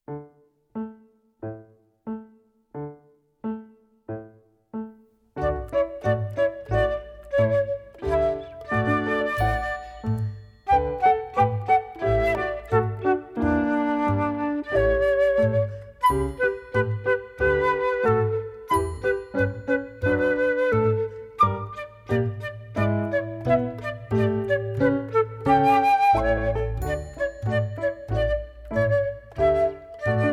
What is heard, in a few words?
Dance Of The Reed Pipes; Flute Time Christmas